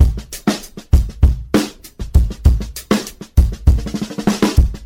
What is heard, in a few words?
Drum loops, Funky